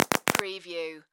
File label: Electric Spark Burst